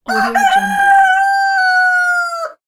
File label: cock crowing